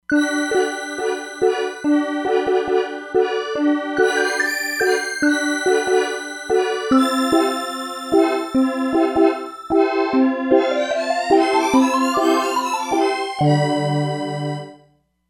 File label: Bali Reeds, E-mu Proteus-3; Factory patches